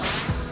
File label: bonus word; usa